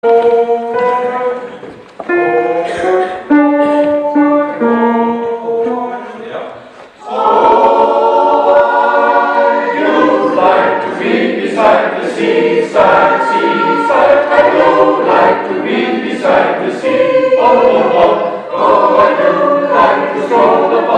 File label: tutti